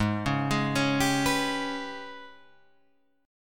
Ab Minor Major 7th Double Flat 5th, down, Guitar, Open G